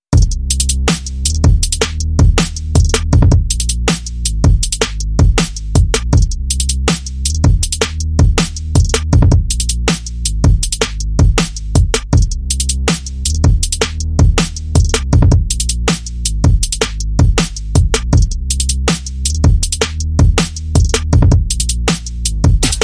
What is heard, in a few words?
Drum and Bass